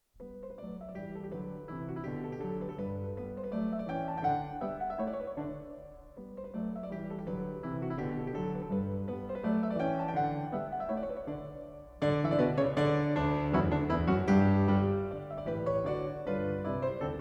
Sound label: Piano Sonata No. 25 in G major, Vivace; Beethoven; ("Cuckoo"), Op. 79